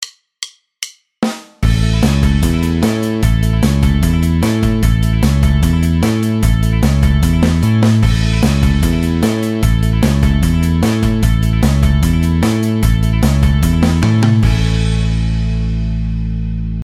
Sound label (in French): arpeges exercice, basse; exercices